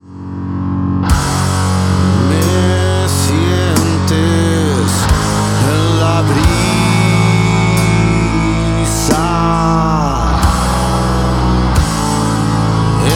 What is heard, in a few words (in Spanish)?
inicio canto